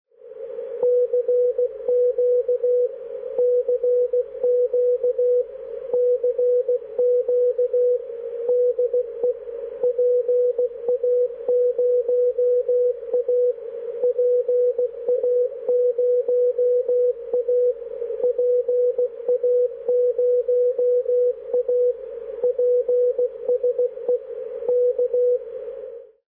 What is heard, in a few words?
502.5kHz